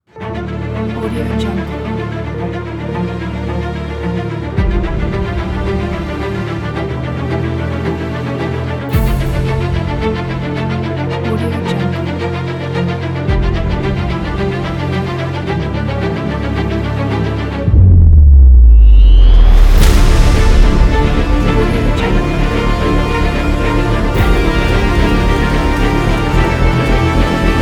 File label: cinematic epic trailer